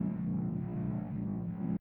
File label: heal beam; Mindustry